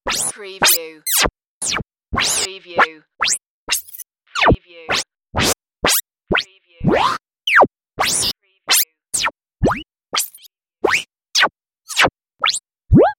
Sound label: space game sounds